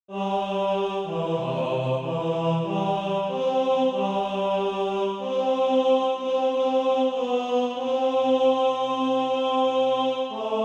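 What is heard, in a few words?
tenor, SATB Practice Music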